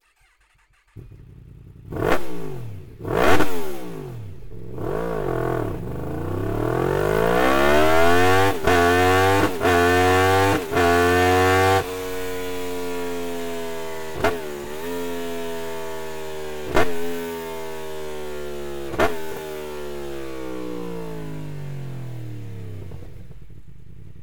racing exhaust system yamaha fz10; akrapovic

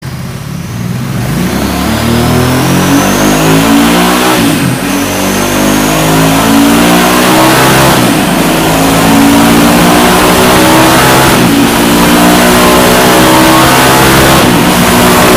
gp style evoii slip on exhaust ducati hypermotard; leo vince

